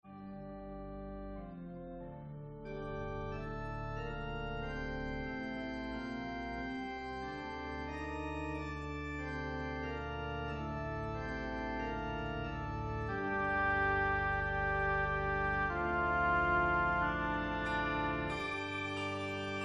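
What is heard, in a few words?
Intro handbell C instrument Organ Oboe; O Dearest Jesus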